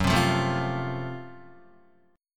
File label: Gb Minor Major 7th Flat 5th Gb Guitar; strummed; 2,3,3,2,0,0; 4E,4A,5D,5G,5C,6F